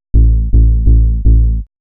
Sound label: HOUSE BASS 125 A